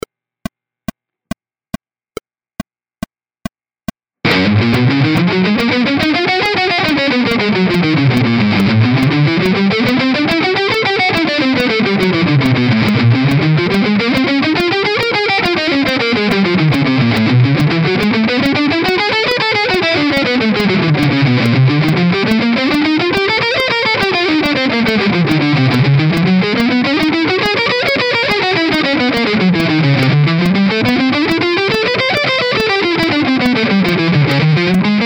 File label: scales rhythmic variation